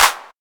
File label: clap c sharp key; percussion; single hits one shots